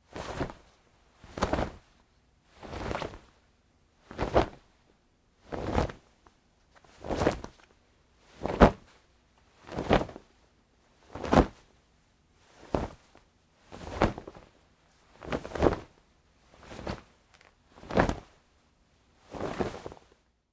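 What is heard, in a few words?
Foley Fight Moves Jeans Singles Light, wrestling and struggling; Big Room Sound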